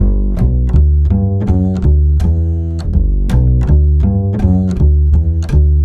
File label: Acoustic Bass